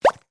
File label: effect retrigger count appear